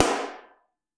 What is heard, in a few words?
SN3 Mono, Snare